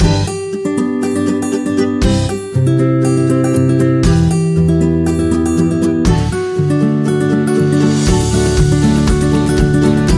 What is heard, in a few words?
Quirky; happy music vol 2